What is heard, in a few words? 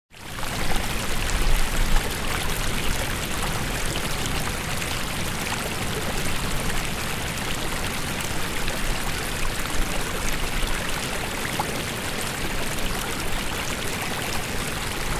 water